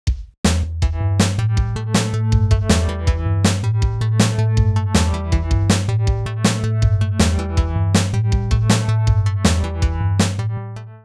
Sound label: rock